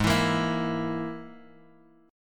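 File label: Ab Suspended 2nd Sharp 5th chord, Guitar, Open F tuning; strummed; 8,11,10,11,x,x; JGuitar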